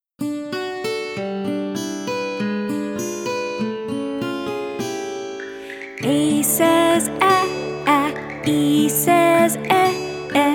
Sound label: Vowel Song; ABeka